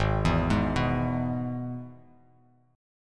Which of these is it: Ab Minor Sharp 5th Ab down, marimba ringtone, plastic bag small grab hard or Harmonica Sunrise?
Ab Minor Sharp 5th Ab down